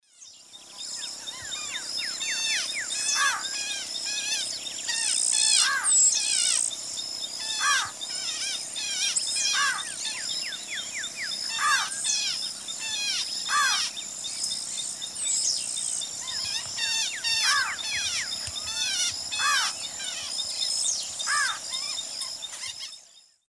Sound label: Thamnophilus doliatus, calls 2 females; S. America; Eastern Lowlands